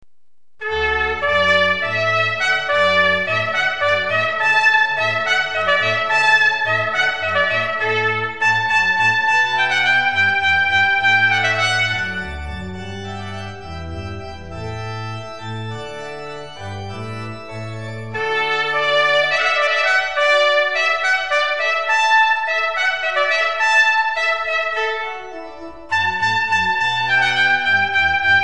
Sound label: hornpipe